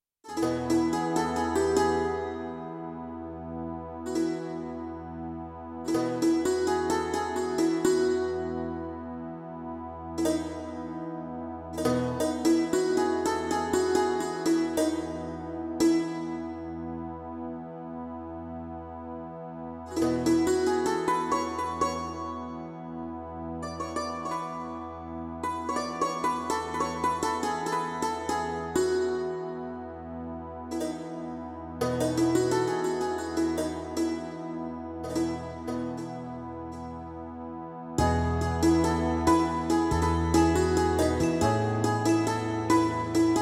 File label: Santoor